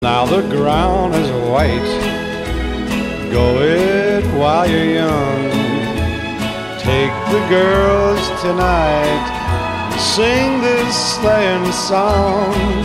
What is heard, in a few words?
Jingle Bells